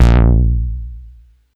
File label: Bass Juno B; Vengeance Samples